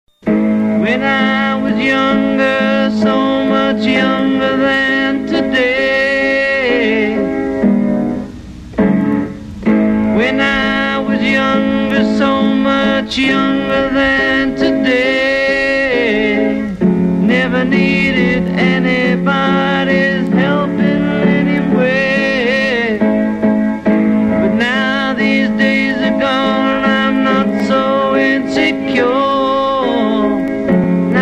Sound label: Piano